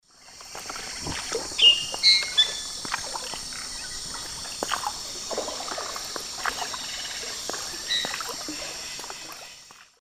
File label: Pionites melanocephalus, calls; S. America; Eastern Lowlands